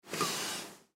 Stool Cushion, Stool Cushion Get Up Doctor Office; Hospital; Emergency

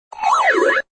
Video Game Sound, Video game sound; Part of Video Games (Sound Effects:Games)

